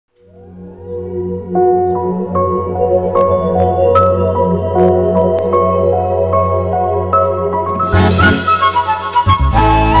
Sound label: love bgm; sulthan; rashmika entry bgm